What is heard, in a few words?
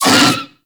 Kubrow Armored Vocal Pain; Warframe